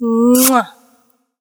kiss; Beauty Fruity; wazdan

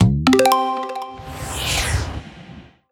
gambler win; gambling; Beauty Fruity; wazdan

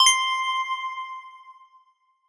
gambler levelup; DragonsLucky8WD; wazdan; gambling

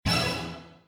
prize hit; Burning Stars; wazdan

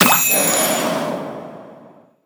wild win; Butterfly Lovers; wazdan

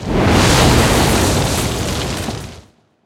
fire mask; Burning Reels; wazdan

